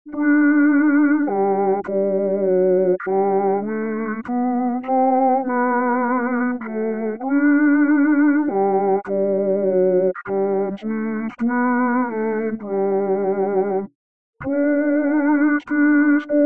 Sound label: SATB Sop for Tenors